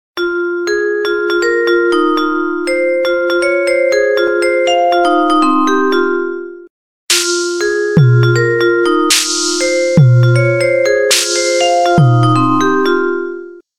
Xylophone Ringtone

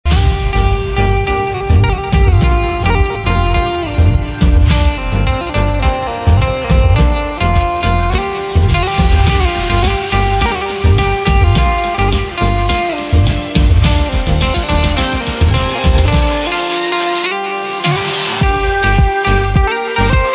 Banjo Instrumental Ringtone; devotional instrumental ringtone

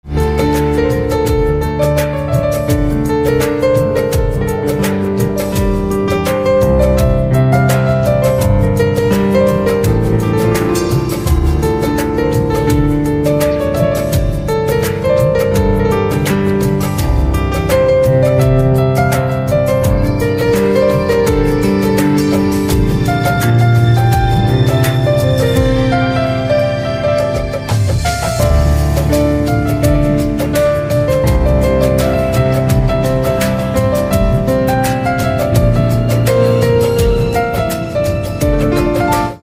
Piano Instrumental Ringtone

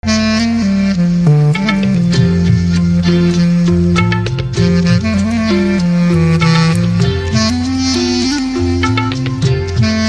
Saxophone Instrumental Ringtone

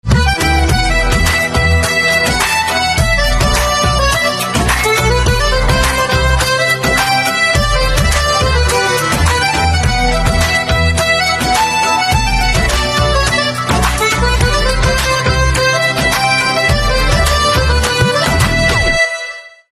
Accordion Instrumental Ringtone

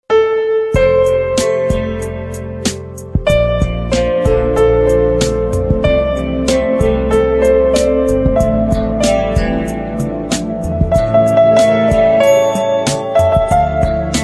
Piano

